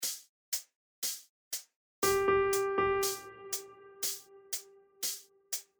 Syncopette Binaire

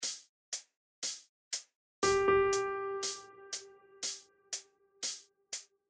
Double croche Croche pointée Binaire

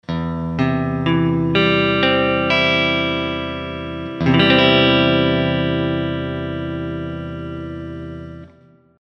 DO Majeur 6 cordes

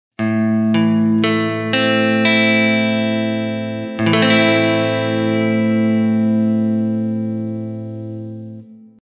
La mineur

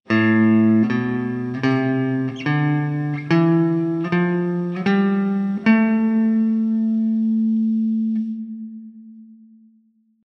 Gamme La mineur Corde De La

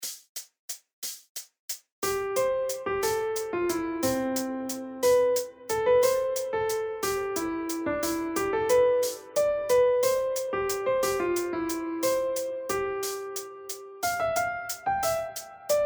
EXERCICE TERNAIRE

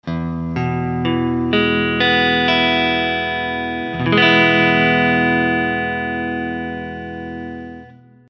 MI Majeur

